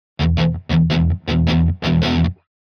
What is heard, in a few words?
Guitar Slow Heavy Metal Rhythm - Short; Hollywood Edge; sound designer's toolkit v2